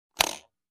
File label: Spring snapback